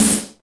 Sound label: Electric Shock; Majin Tensei